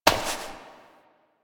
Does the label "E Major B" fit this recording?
No